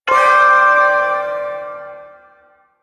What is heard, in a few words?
Ersatz Shawzin Pent Maj Chord; Warframe; Duviri Ersatz Shawzin